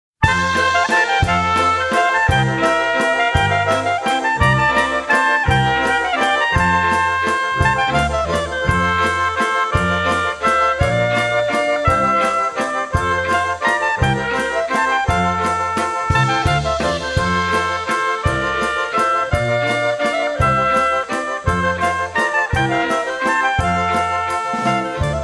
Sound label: Waltz